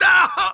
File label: hit; NWNDS; nwnds audio